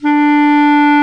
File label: Clarinet & Bass Clarinet